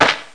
SAND

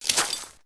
glass; The Sum of All Fears

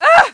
OUCH; Renaissance

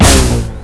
knock

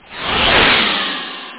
i zoom2; Chomp3D

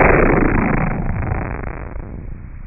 Electric Explosion; CManual-3.0

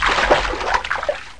water spt; ClickArt 300,000

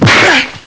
punch

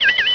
Ring Out; Unreal

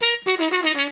button; Mulligan & His Steam Shovel

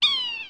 ssucker; ambients

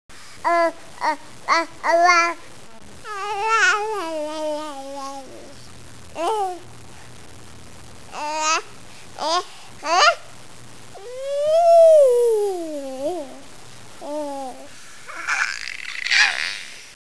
baby

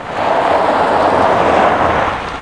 SKID; PC Gamer 4.2; mtm2demo

